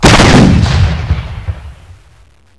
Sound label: tiger cannon fire; WAR